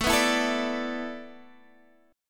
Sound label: A Suspended 2nd Suspended 4th, Guitar, Standard; Asus2sus4; strummed; JGuitar